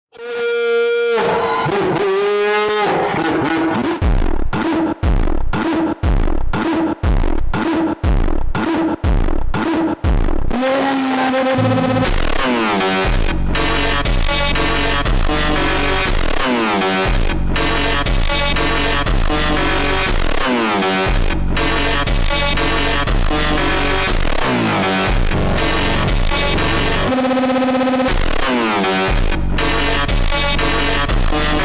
Korean funk super slowed

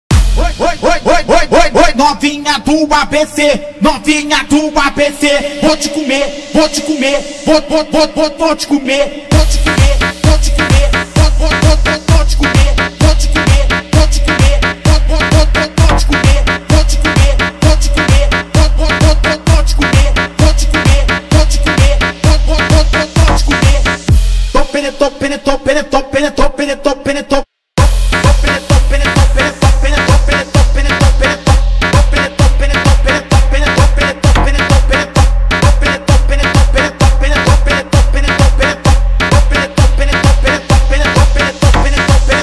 arabic trap music bass moombahton

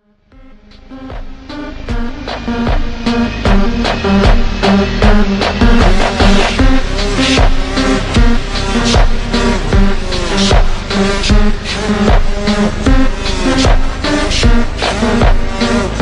super slowed looped

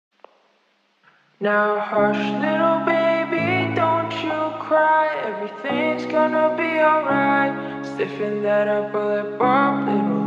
music slowed reverb

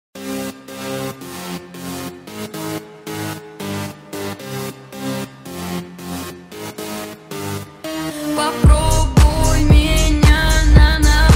slowed reverb

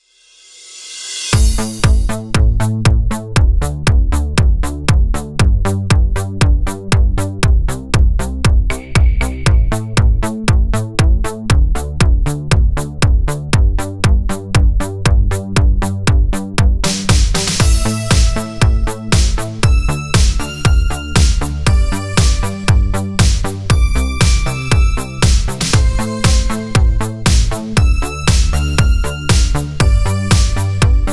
Italo disco remix, 80s music

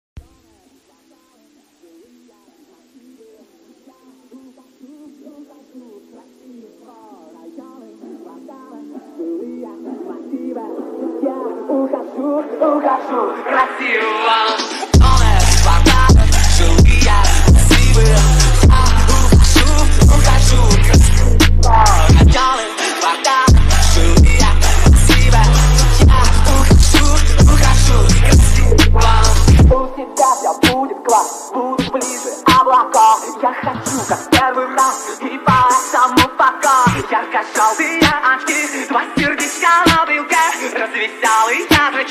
phonk edition

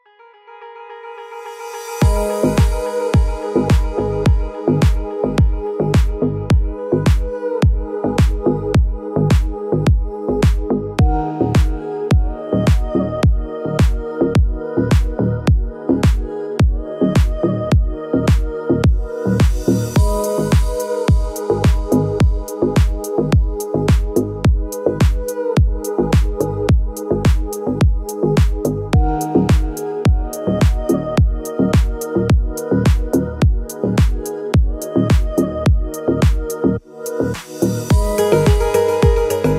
deep house

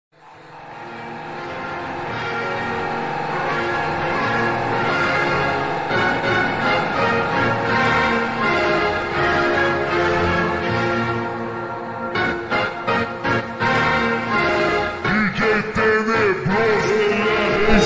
slowed

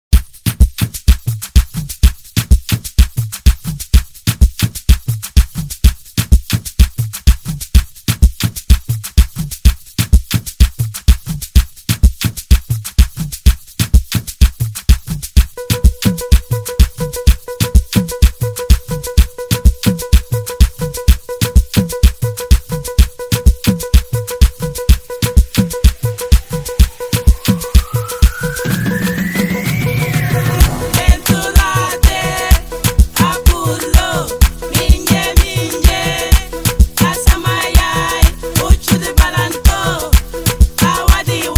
azəri bass music african drums

